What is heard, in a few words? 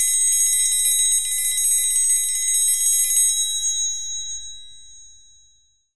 triangle